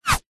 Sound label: bullet pass by; zapsplat